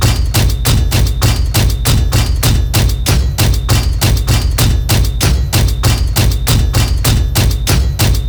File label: Door Malfunction Hits Loop; Tauron; Warframe; Betrayer